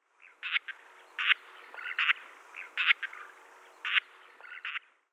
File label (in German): Steppenkiebitz Vanellus gregarius; Vogelstimmen